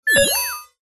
science fiction computer burst beep tone

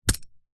warfare knife throw dig into grass hard

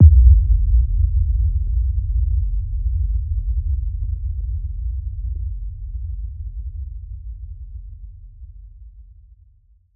LF Impact Thud; noise creations

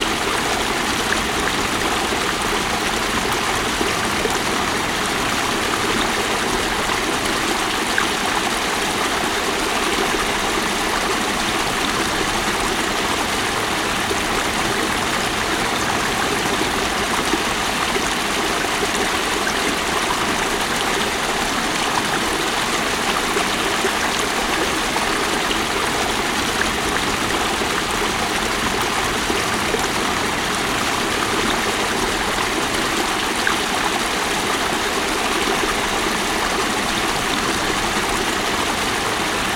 waterfall medium small some birds in distance

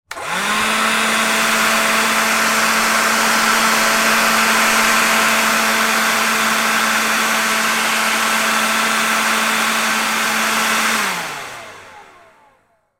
industrial leaf blower on run short off

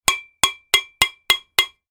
impact metal small pipe hit another x6

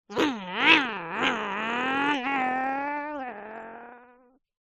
child car noise mouth start away; human; zapsplat

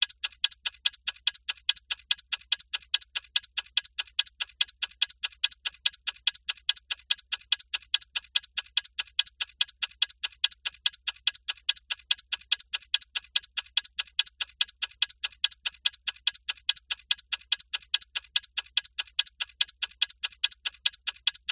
warfare bomb ticking

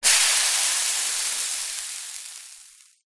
nature water hit hot metal sizzle bubble

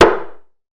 impact gaval x1; zapsplat

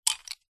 stationery pencils in hand clutch; zapsplat

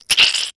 human puss squeeze squirt

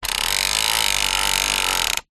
leisure toy car push wheels click

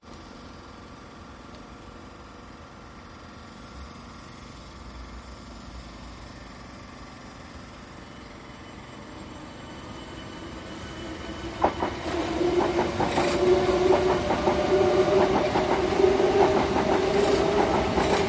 train distance passing by london

